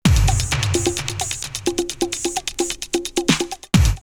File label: Tekno Break 130, drumloops, Techno&House; X-Static Goldmine